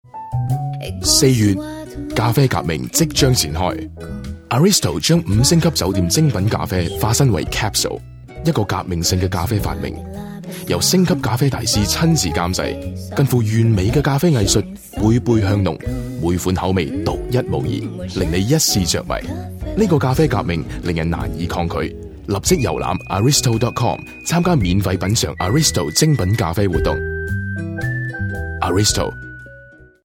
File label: music; Aristto